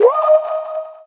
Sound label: friend join